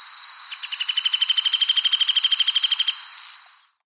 Yellow eared woodpecker

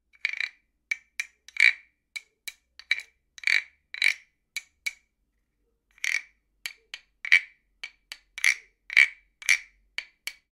Wooden Fish

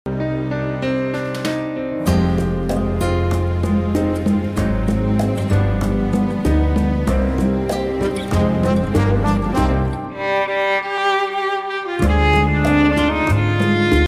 viola da gamba

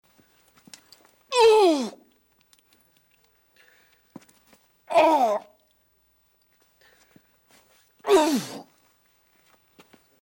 man sighs

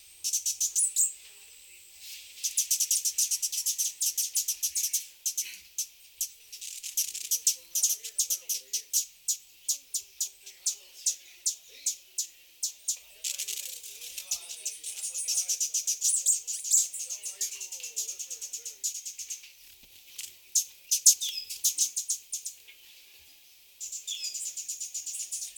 Cuban emerald

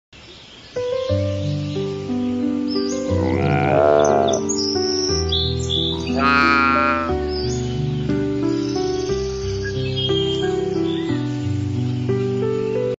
Addax; تابیده شاخ

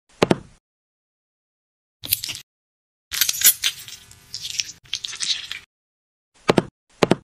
Toothpaste, خمیر دندان

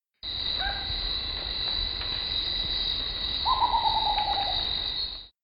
Rufous potoo

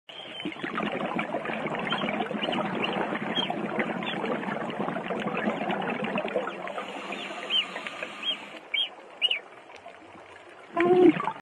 Dugong

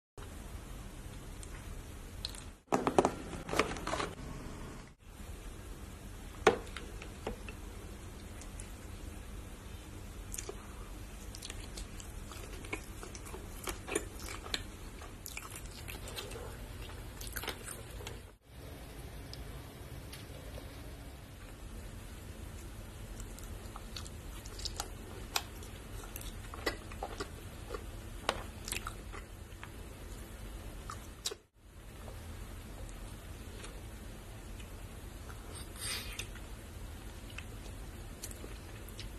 fruit roll

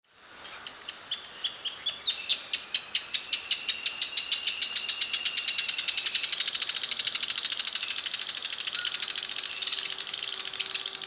Pink legged graveteiro; پرنده گورمرغ پا صورتی

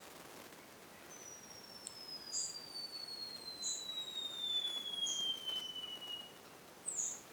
Sharpbill

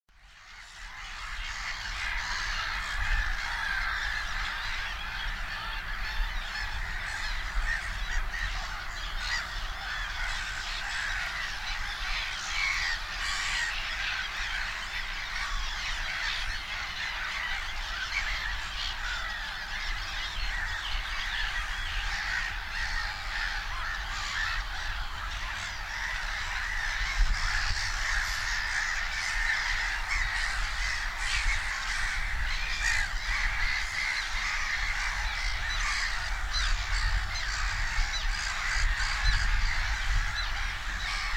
Black headed gull; پرنده کاکایی سرسیاه کوچک

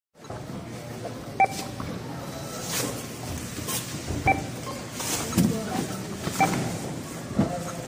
Scanner

